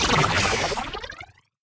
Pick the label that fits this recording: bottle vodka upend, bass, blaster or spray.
spray